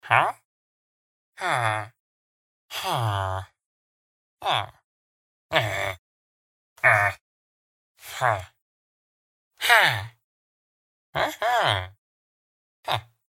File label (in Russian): Звуки жителя майнкрафт; Minecraft